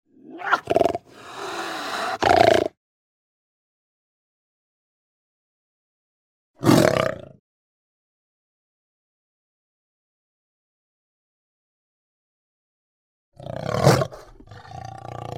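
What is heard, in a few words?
puma golos, puma voice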